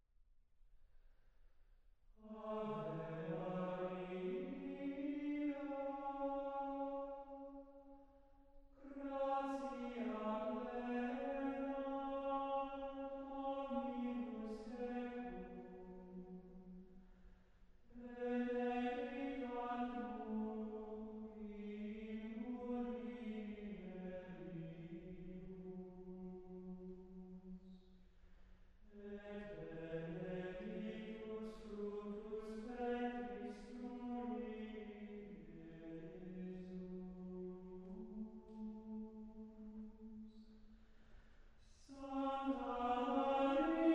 Ave Maria, Gregorian Chant

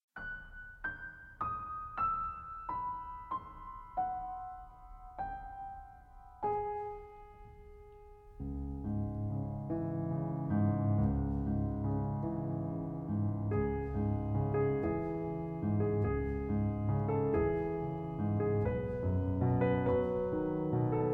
Piano Solo; The Corpse Bride

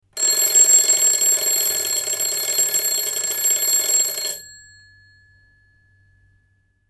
Old clock ringing short

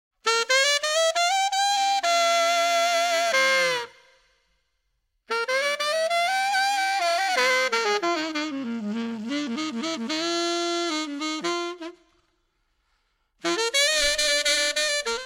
Quartet Blues

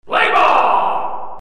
wakeup

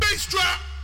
Basedrum

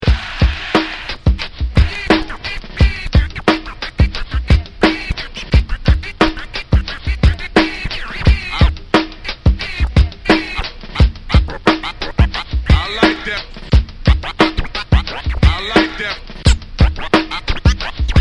Scratch

